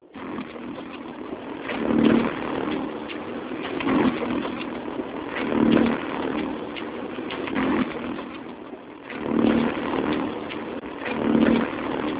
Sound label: Stag Beetle